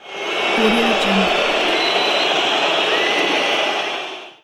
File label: soccer crowd booing